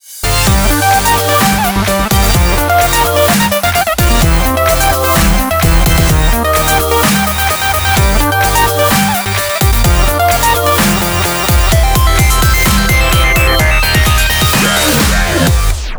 short digitalized; The Bug Butcher

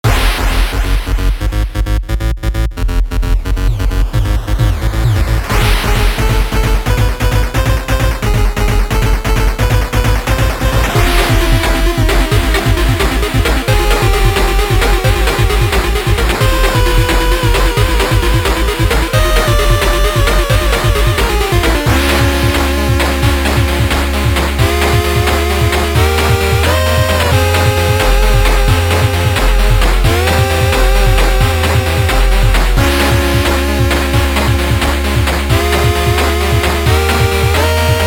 Final Battle; Tower and Sword of Succubus

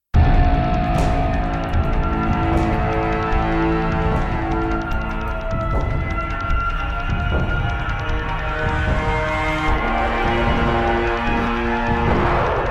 Dragon Loop; Infinity Blade 3

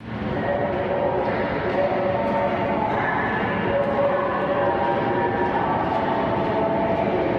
Ambience; grimrush

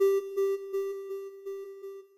Destroy Melod; Puddle; puddle plus ios gamerip 2018

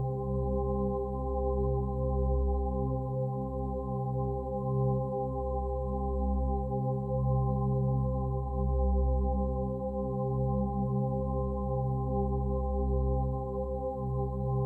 amb portal

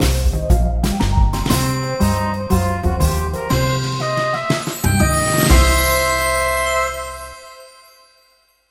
Fanfare Perfect; Robbery Bob Man of Steal; Android

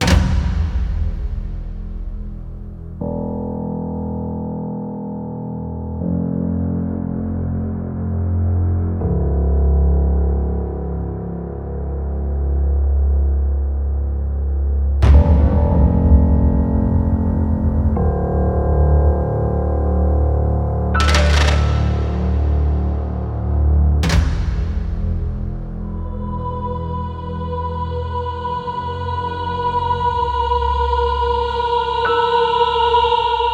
Scary Dungeon Loop; Eternal Edge

